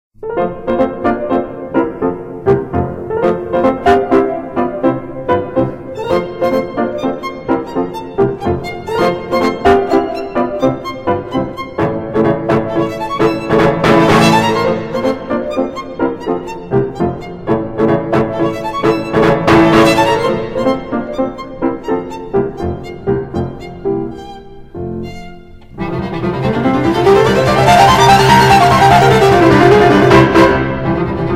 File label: Violin Sonata No 5 in F Major 'Spring'; 3. Scherzo- Allegro ma non troppo; Beethoven